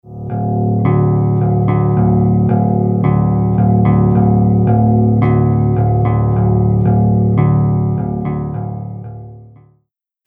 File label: Clockworks